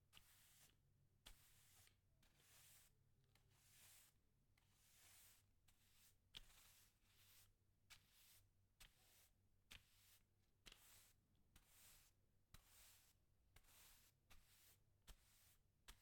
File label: Sweeping Tiles Broomstick